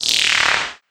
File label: Hi Hat